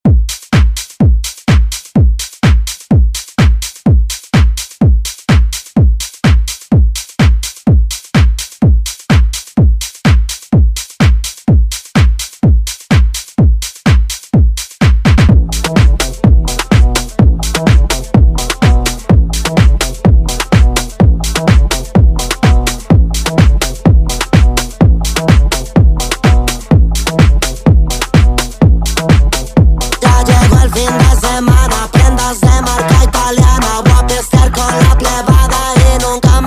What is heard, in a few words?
Latin House Redrum